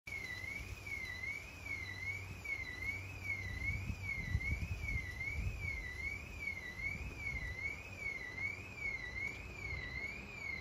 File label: House Alarm